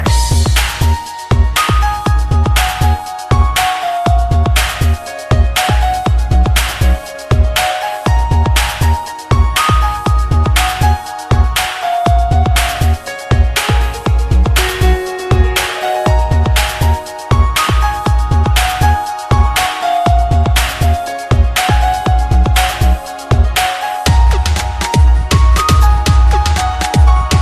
Relaxing Mix

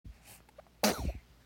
Baby Sneeeeze

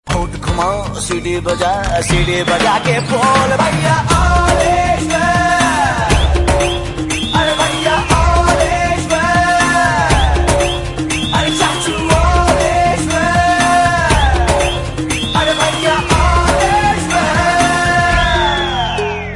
Chorus

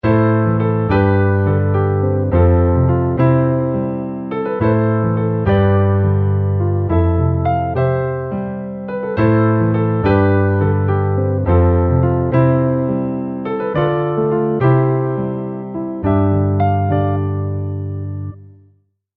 Romantic Piano

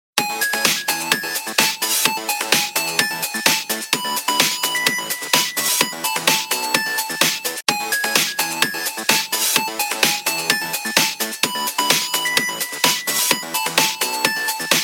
Bell Mix

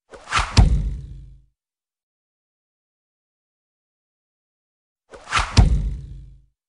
Knife Throw

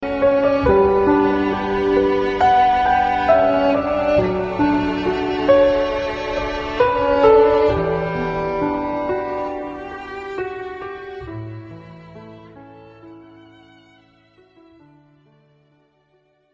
Serenade Sms

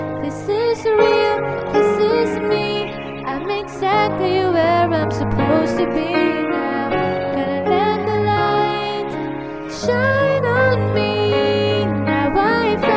Piano Ver

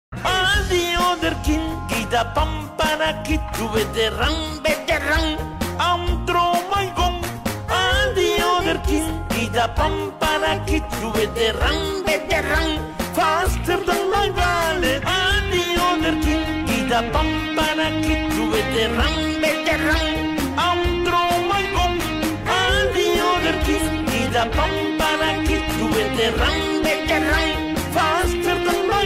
Dancing triangle

